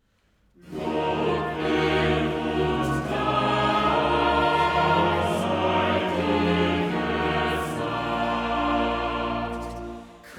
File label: Chorale; Bach; cantatas; BWV121; Christum wir sollen loben schon